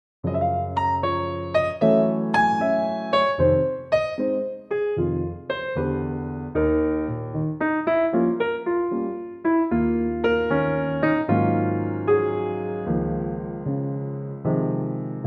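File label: Db piano, male; key - Db - vocal range - Bb to Db; piano only arrangement; Pianotrak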